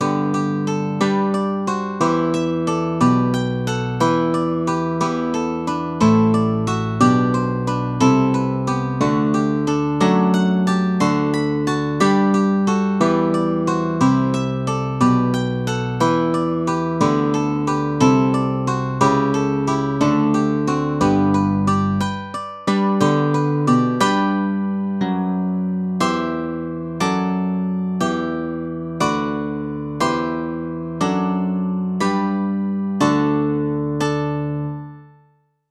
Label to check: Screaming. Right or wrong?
Wrong